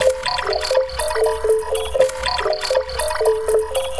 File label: Mbira Twittering, Acoustic Beats; Sonic Foundry (Sony Creative Software); Myths of Technology